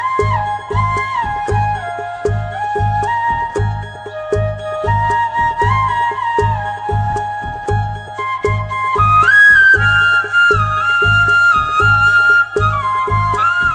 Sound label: Heart Touching Flute